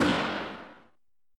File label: spikes; ReadingRaiders